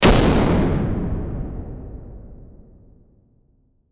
a satisfying, shooter

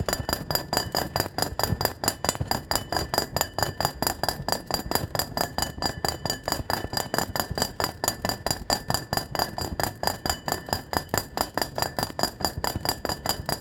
a seamless looping mediev, blacksmith

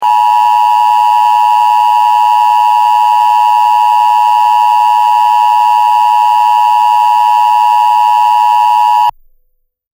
japan disaster alart sist

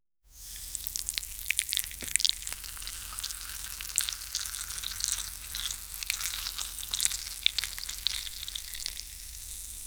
liquid

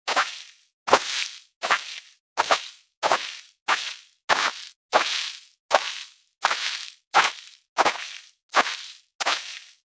rapid footsteps on soft, sand

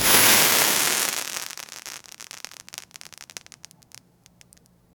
firework fizzle

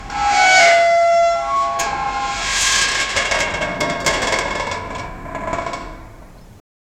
the terrible sound of lar, industrial

